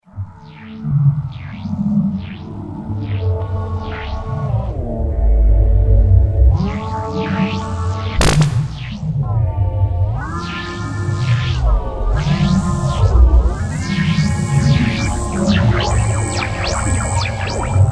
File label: Roland SR-JV80-04 Vintage Synth; Aural Enigma